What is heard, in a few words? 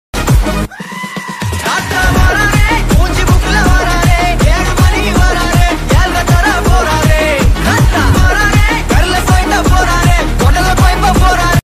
bgm ringtone